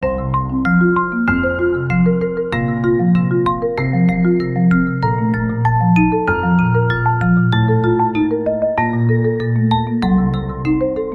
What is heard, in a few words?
Sincere Marimba